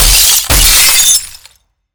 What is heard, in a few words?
ramp glasstastrophe; Portal 2; scripted sequences